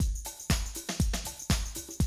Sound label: 120, Classic Drum Loops